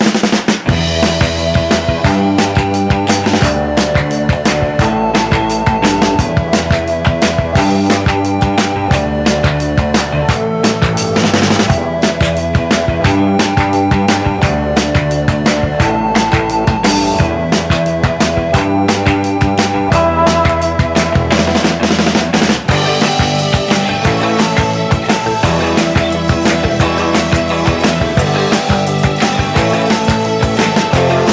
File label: Epic Confrontation High; bully scholarship edition